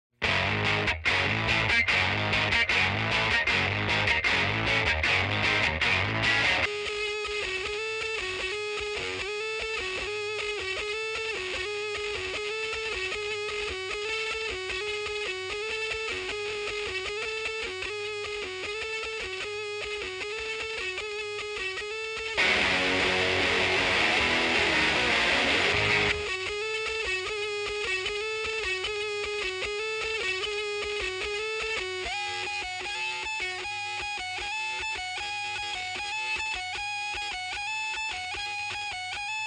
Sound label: guitar